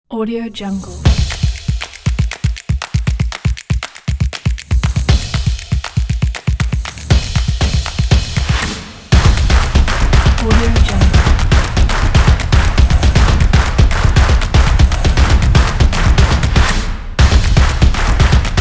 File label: the clap